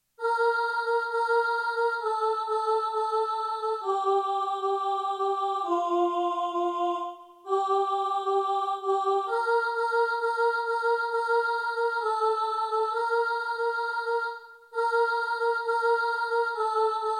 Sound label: soprano, choir